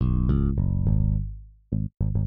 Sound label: Bassloop